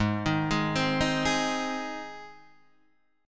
G sharp Major G sharp Guitar Half Step Down, chord